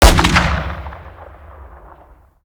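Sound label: weapon br2 fire player atmo ext; gmod; viper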